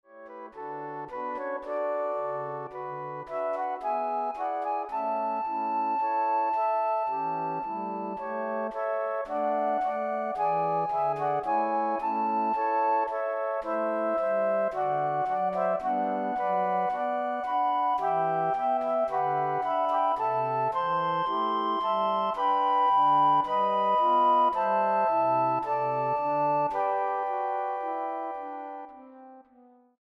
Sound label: Flute Ensemble